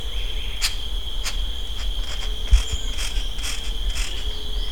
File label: Tityra inquisitor